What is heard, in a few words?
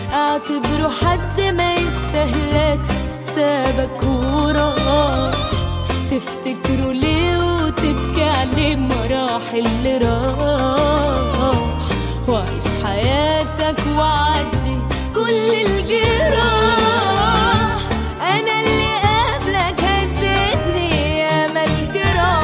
Arabic Song